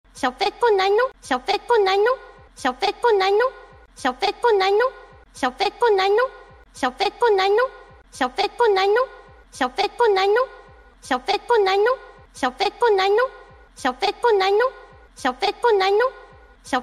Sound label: cute